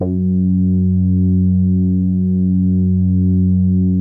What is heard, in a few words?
BIT BASS F#, CRUMAR BIT01, Vintage Keyboards; Big Fish Audio - First Call Vintage Keyboards